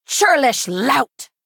attack; supreme atromancer

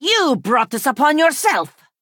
attack; quin rawl skulker

